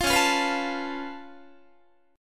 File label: Db Augmented 7th Db down Guitar